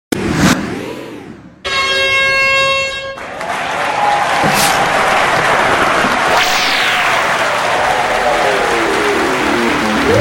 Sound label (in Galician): AMBIENTE